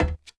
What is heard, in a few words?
clank, player steps; quake2